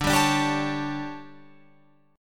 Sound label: D Major 7th Suspended 4th, Guitar, Open F